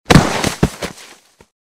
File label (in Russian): na goru shhebnja ili stroitelnogo musora s osypju; Звуки падения человека